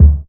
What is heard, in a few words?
short kick single shot d key